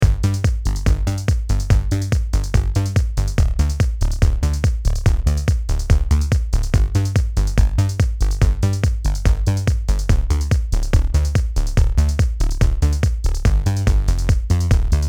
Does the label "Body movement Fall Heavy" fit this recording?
No